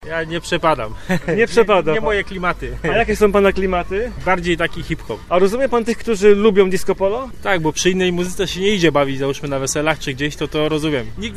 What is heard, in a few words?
disco